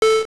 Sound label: new bip